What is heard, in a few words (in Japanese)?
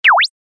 場面切り替え ピョイ